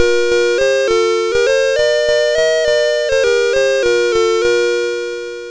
sid MOS6581R3, GAMES; Alice in Wonderland; hvsc

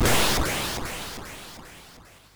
War Cars Construction Kit, MOS6581R3

